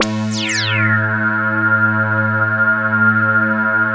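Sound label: OBERHM OBXA, Oberheim OB-Xa; Big Fish Audio - First Call Vintage Keyboards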